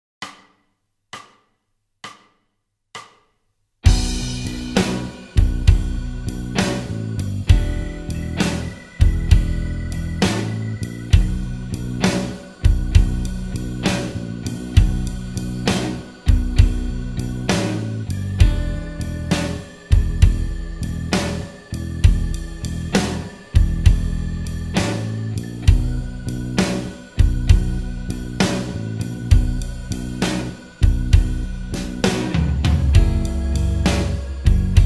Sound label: Bends slides and Shifts